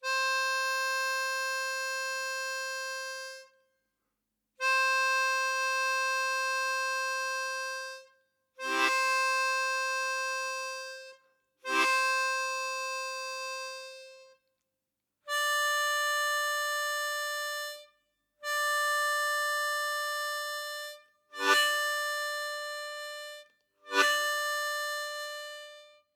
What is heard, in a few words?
Tongue Blocked Single Notes, Tongue Slap, blues harp